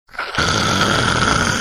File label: making long scraping sound